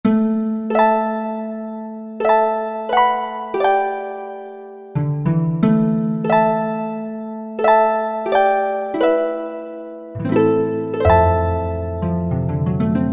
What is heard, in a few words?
Yamaha TG500, FI Harp